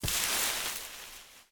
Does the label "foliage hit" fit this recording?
Yes